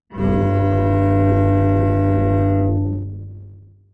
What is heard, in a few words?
cello; Lemur